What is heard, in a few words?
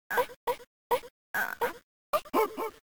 BGM BOMB; WarioWare Inc